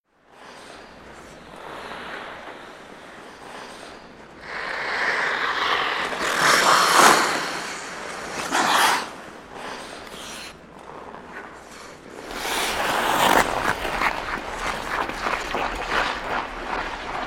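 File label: Hockey Players Skate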